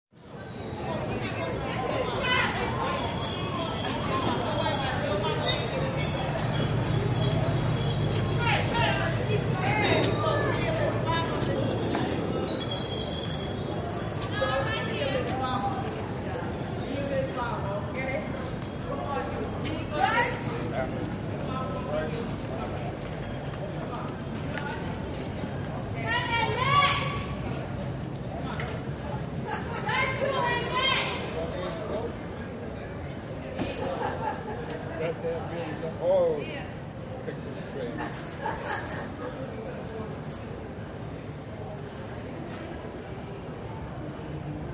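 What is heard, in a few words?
Harlem Block Ambience; Close To Distant Reverberated Walla - Young Women, Men, Footsteps. Light Distant Traffic Roar, Brief Car Alarm Medium Close Car By.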